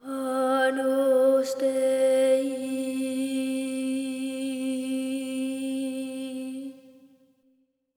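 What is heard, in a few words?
agnus boy, Symphony of Voices, boy phrase; Spectrasonics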